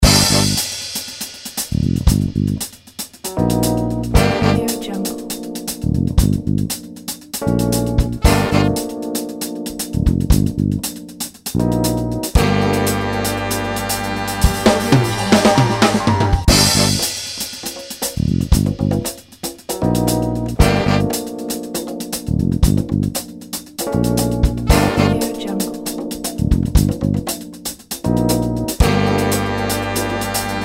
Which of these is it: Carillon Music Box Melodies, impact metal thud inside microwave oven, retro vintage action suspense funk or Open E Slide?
retro vintage action suspense funk